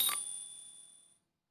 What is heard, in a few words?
vega build; fertig classic games